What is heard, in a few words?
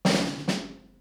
drumroll